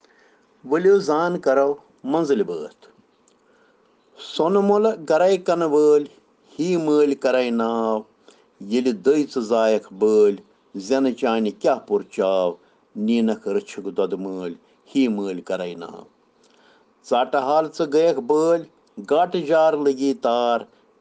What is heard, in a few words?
Children Rhyms in Kashmiri